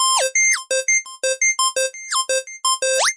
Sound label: cheesy bleep glider; Weird and Interesting Hits; single hits